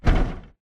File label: bt footstep jog parts; Titanfall 2